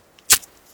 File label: spark